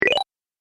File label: Pickup Points, video game sound effects